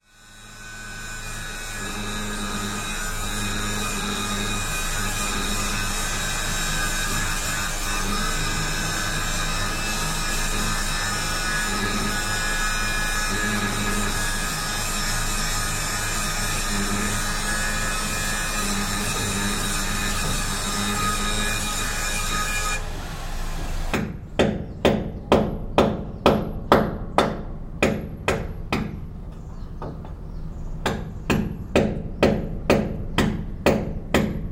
Construction Wall Slams

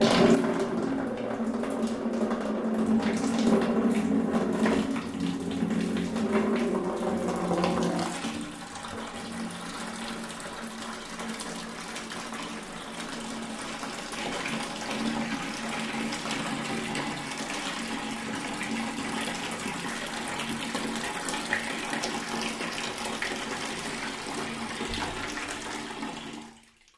Water Bucket

